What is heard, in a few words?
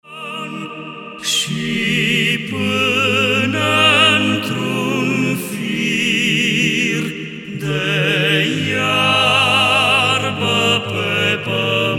Voice Quartet; Cat de Marit; florilegiu